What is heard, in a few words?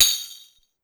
Tamb, Clavia DDrum AT, Pitched Down